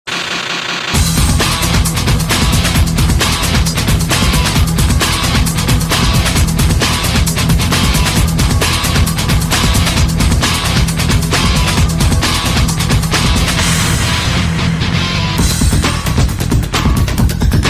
Hard Rock Tone

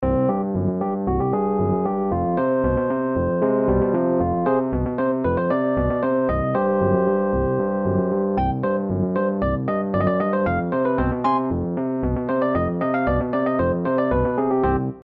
8 Bit

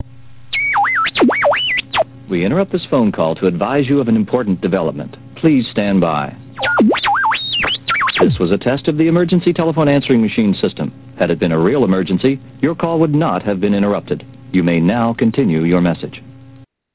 Voice-mail

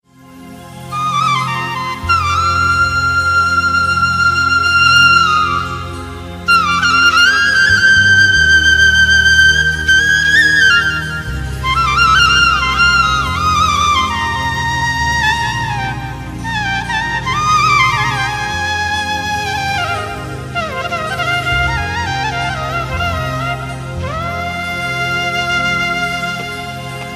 Nice Flute

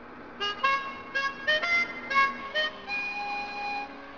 Harp

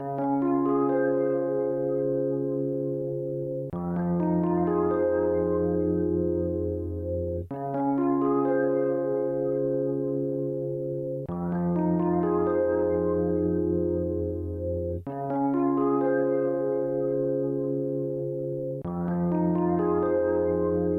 Mystic Ring

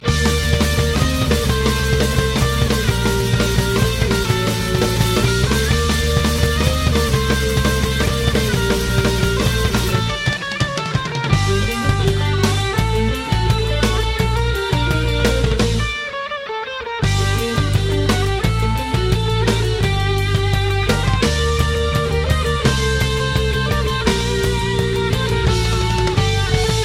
Baroque

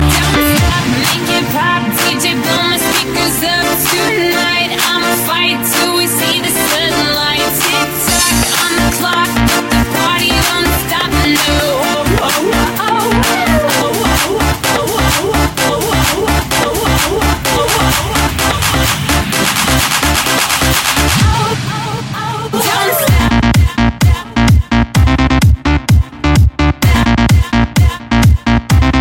Tic Tok Electro

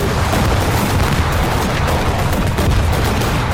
Explosions

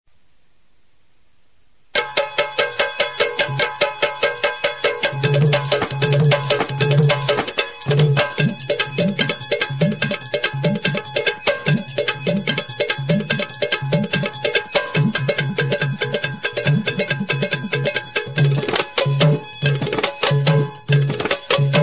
Country Style

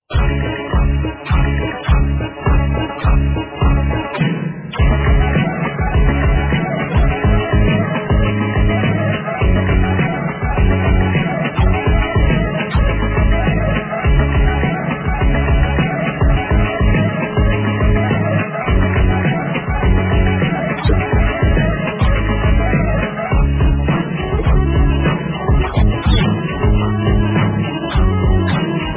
Slow; Oceans-12

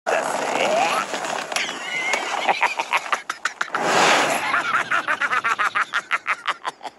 Laughing; Inosuke

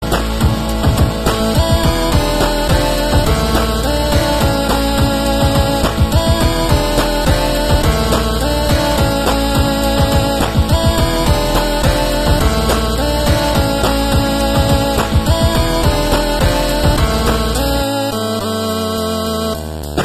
8bit

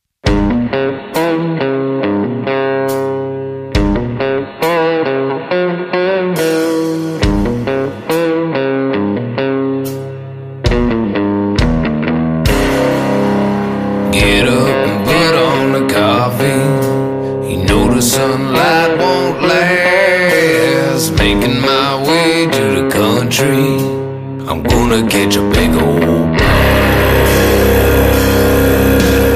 Big Ole Bass

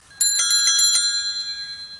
Bicycle Bell; Oviya

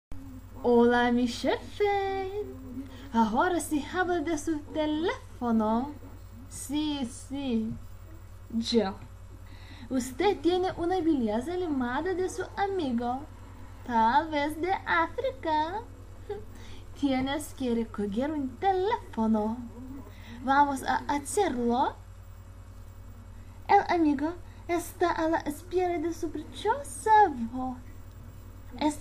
Spanish Call

